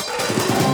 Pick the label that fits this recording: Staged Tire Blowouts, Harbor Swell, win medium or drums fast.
drums fast